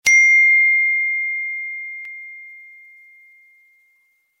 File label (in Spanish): notificacion de iphone